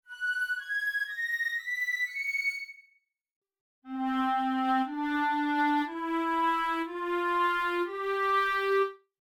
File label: flute clarinet